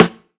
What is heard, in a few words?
SNR, Snares